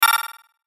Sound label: chime chopper; Multimedia; Chimes; Blastwave